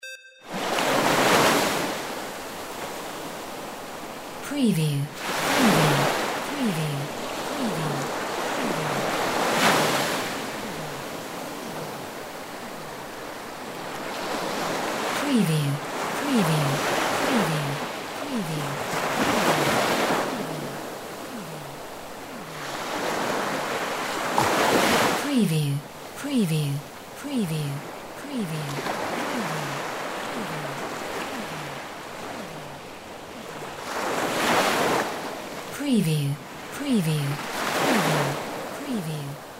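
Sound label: WATER SEASHORE WAVES; windy beach audio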